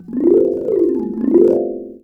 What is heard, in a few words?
RANATTHUN; Spectrasonics - Heart of Asia CD2